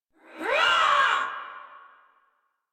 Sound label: Attack Effort; Warframe; Dax; Ranged